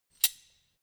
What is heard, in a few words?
wrench slide open; schrauben drehen hydraulik